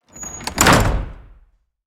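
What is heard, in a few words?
wood double light close, door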